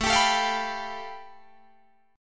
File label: A 7th Suspended 2nd Sharp 5th A, Guitar Drop D; chord